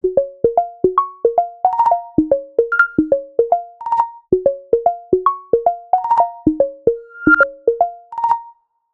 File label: Water Bounce, ringtone; Samsung; Galaxy A56